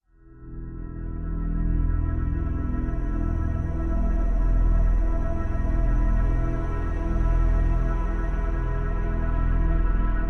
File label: Pads Only